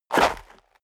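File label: step dress boots gravel light jump, footsteps; schritte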